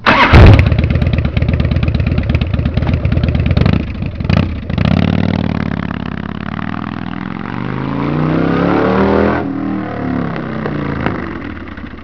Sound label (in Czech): dyna, chopper a cruiser